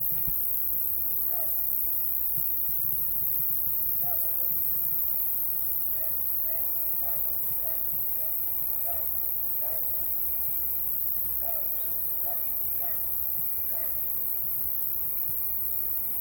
Cicadas; a pedreira

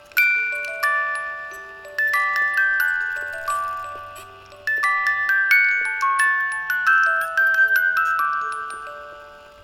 Music Box Loop

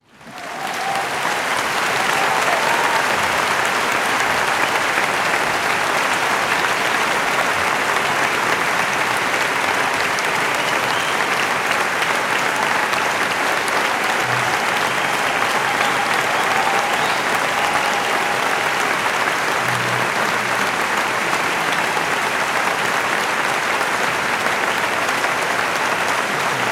Applause

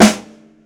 Snare Hard

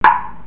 Racquet

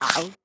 Nom

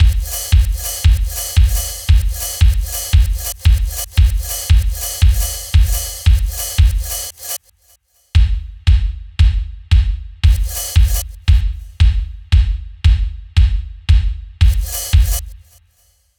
Drums For Electro Pop

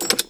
Metal Click

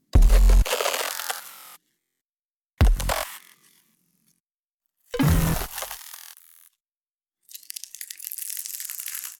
Abstract Glitch Impacts

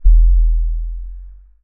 Low Thump

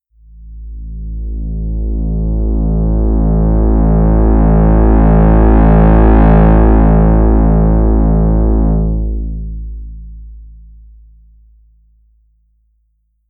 Building A Complex Waveform; Electronic Minute No 302; Vcv

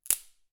Twig Snap

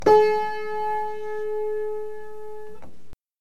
Piano

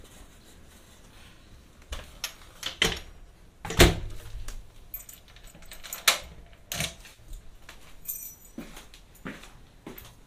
Go Downstairs

